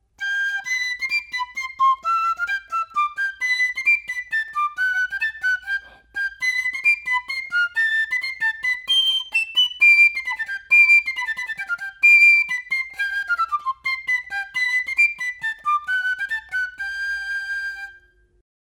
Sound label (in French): flute